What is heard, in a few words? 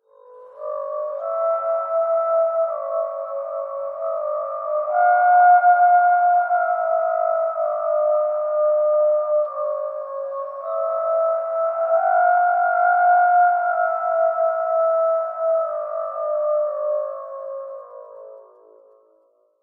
science fiction horn weird alien distant musical horn blow; zapsplat